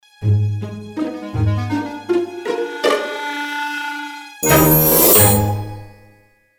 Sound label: Christmas comedy Stinger